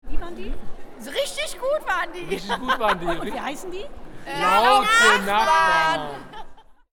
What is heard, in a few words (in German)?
Feedback; Musiknacht 2017